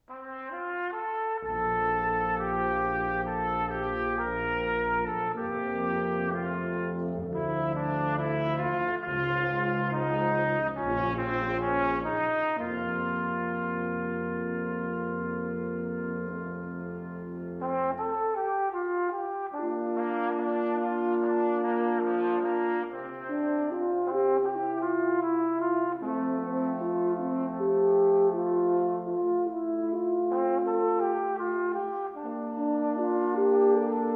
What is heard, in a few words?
Prayer, brass